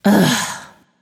"ugh"; smite; voicepack; nike

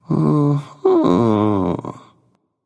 nv thinking; smite; voicepack; ganesha

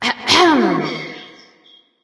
clear throat light; smite; voicepack; hel

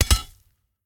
sword drop; smite; gilgamesh

